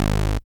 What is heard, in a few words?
bas2; sid